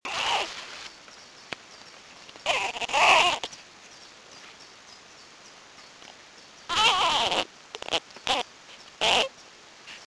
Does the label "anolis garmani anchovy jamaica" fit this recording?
Yes